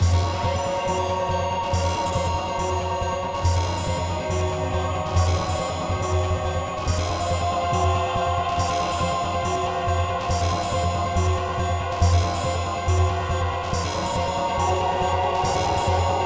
Glitch sonata Slow; rive wreck hack die retry; ps4

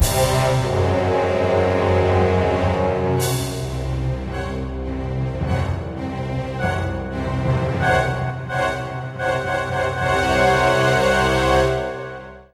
Drama Music

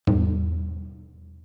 Notestone; Glitch Hero

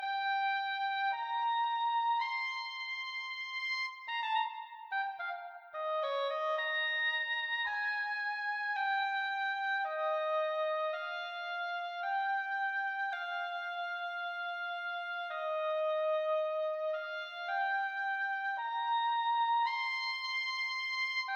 Oboe; Toki Tori 2

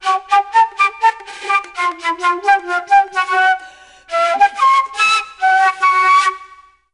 Parl Music

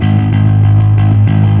Fuzz G sharp 1

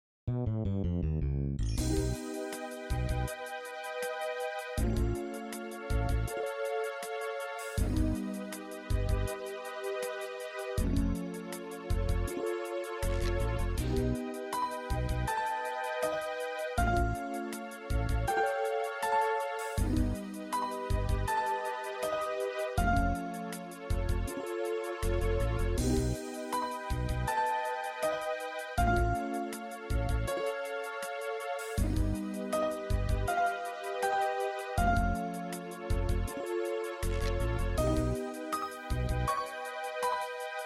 MIDI Scene

